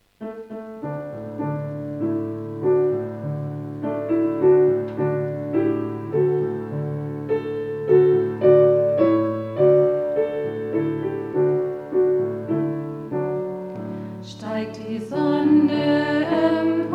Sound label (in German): Sonnenkanon D-Dur; drachenherbst